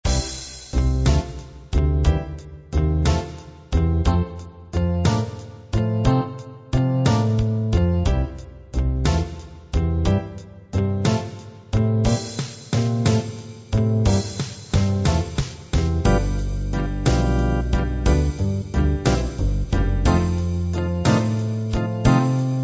slow blues

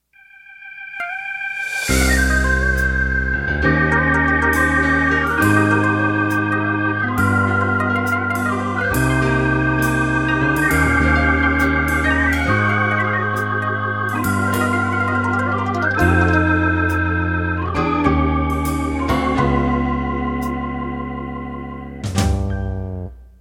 Gospel Rock Intro

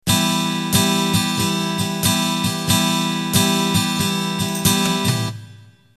Unplugged Slow Rock Intro

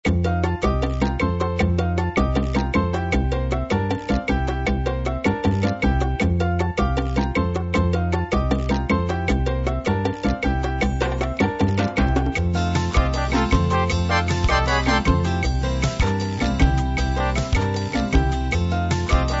bomba

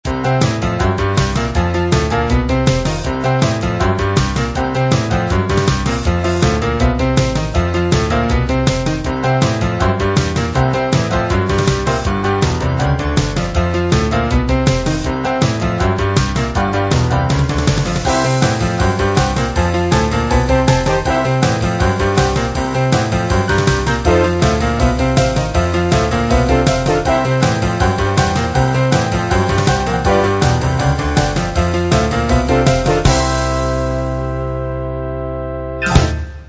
boogie

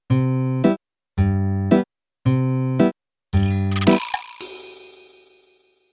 Mariachi Intro

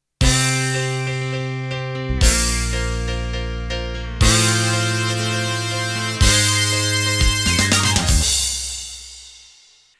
Fire Rock Intro

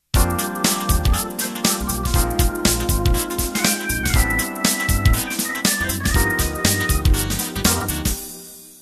Rubber Funk Intro

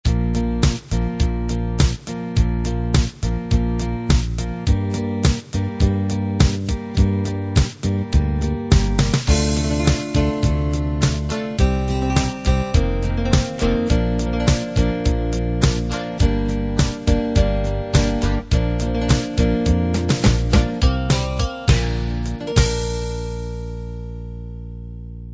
southern rock

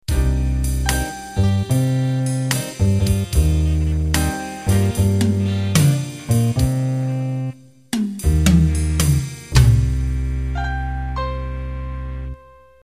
Big Band Ballad End

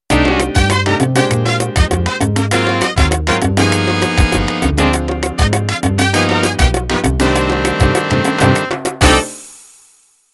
Salsa End

